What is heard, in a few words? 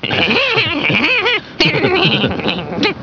laff; beavis